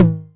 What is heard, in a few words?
Tom Hi, Novation Drumstation; DrumstationV1